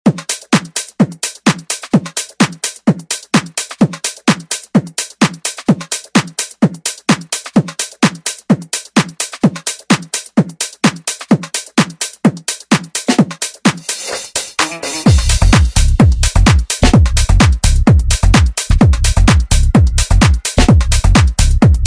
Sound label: House Edit